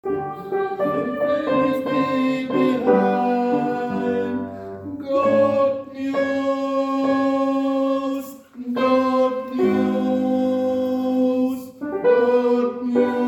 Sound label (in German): Tenor